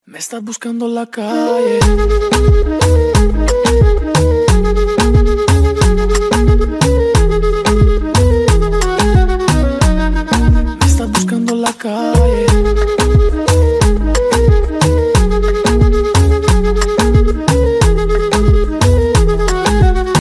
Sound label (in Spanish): Gitana Ringtone